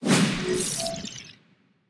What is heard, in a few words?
symbol Disappear; dazzle